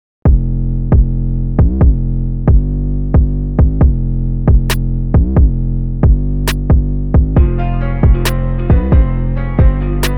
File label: Beat